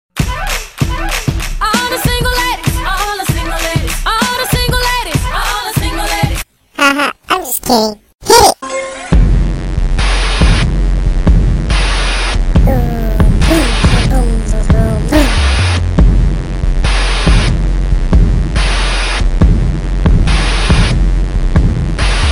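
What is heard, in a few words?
funny chipmunk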